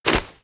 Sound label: get pan